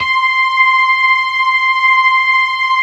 LO MAN V L, LOMANUAL VIB; InVision Interactive; Keith Emerson

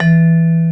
TABLURA

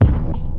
Bass Drum Heavy; Lost Tapes From Mars

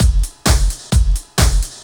straight, Techno Trance Essentials drum loops

